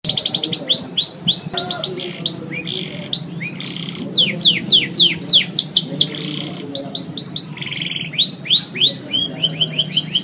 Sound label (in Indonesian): variasi lagu kenari snow white panjang